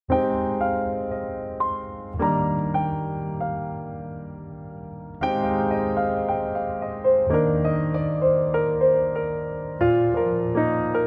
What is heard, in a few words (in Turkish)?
santral fon muzikleri